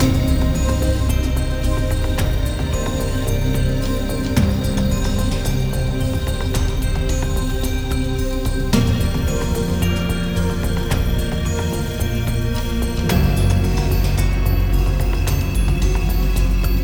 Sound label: Dungeon World Idle LOOP